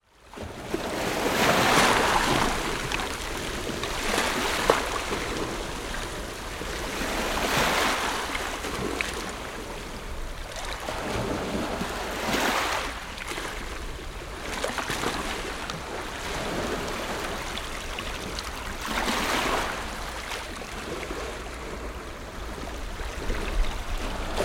water ocean waves calm onto stoney beach and rocks